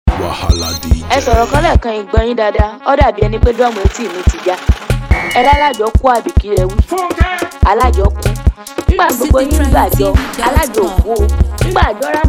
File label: Beat